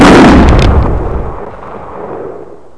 shotgun 1b; gunslinger

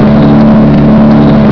tank; dinant

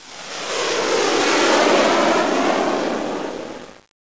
flyby

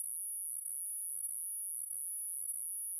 crystal

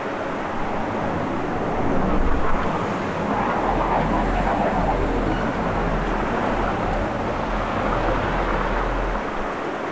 city; openffa

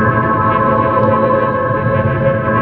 laser; spawngirlsounds; quake

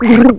gurp; unit00

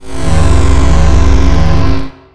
quad fire; xatrix; items

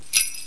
jump; frosty

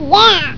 giggle

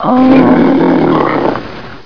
death; opentdm

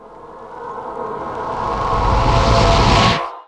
meteor launch, spells

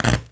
spark

